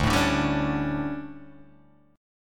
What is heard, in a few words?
D Major 7th Suspended 4th, Guitar, Open G